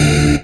SYN Voice Hit; Best Service Gigapack 1

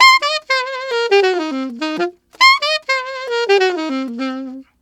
Alto in C min, Alto Sax; Sonic Foundry (Sony Creative Software)

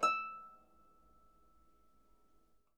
Harp E6; Miroslav Vitous - Percussion & Harp

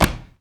SUB, Human Perc, Body Perc; Spectrasonics; Supreme Beats; World Dance

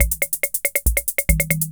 140 POPS

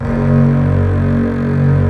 LO ST STRING

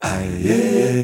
Male Voice, African Voices

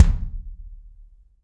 BD AMBIENT, Drums; Real Mega Drums

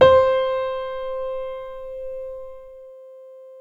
PNO MF; Synclavier Sampler Library

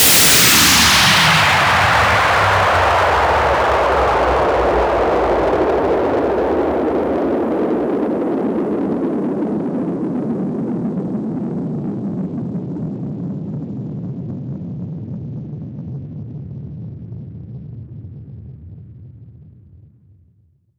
Sweeps Down

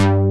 BASS; Pro-Rec - Synthsonic; JV ANALOG

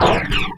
Metals Fx; Sonic Foundry (Sony Creative Software); Esoterik Beatz

